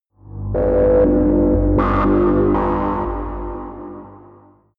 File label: sci-fi cosmic dark energy, horror